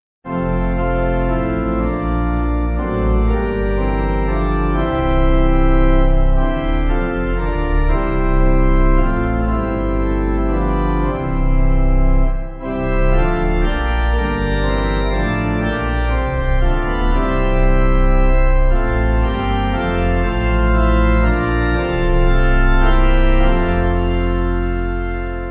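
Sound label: Pipe Organ, Music Backing Files for Churches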